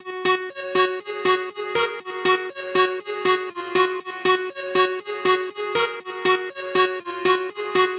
Lead, Dancefloor Pop; Remixlive

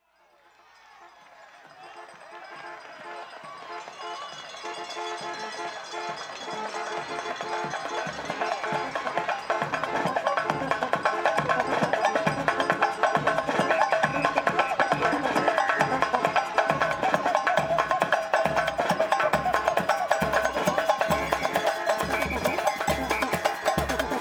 Samba; Samba DeGreeley

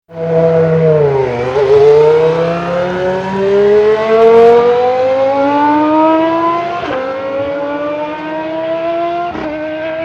Formula 1 car race; Sound Sensation; 100 Sound Effects

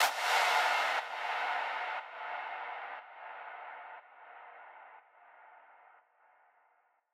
FX; Remixlive; Dancefloor Pop

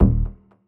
Bass Hit; Remixlive; Dancefloor Pop

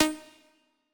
Lead Hit, Dancefloor Pop; Remixlive

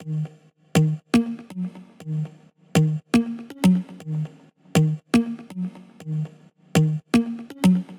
Lead; Dancefloor Pop; Remixlive; FreePacks

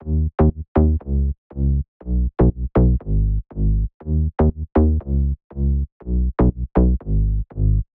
Bass, Dancefloor Pop; Remixlive; FreePacks

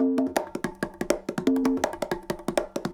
BONGOS; SOUNDS RAW

